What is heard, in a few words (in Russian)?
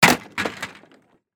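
стул компьютера ударился о землю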